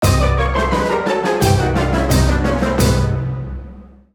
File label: Jingle Gameover; BPM174, 4/4, Cm, Orchestra